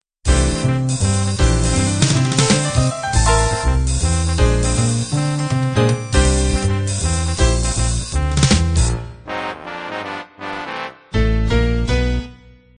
up swing intro

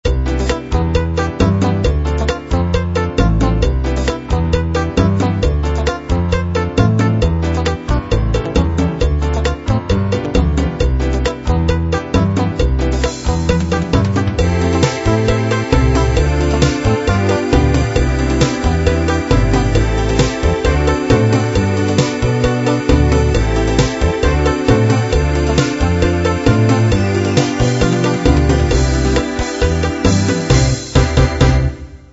chalypso

